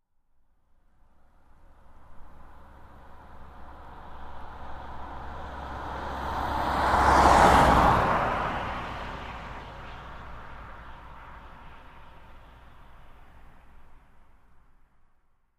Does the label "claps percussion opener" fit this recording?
No